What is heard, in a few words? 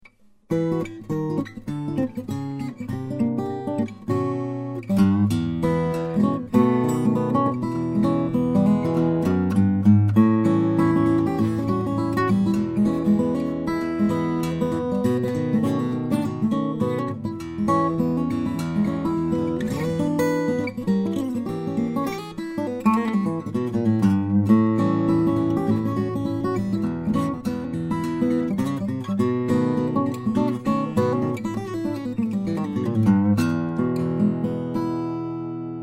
de jonge, steel string guitars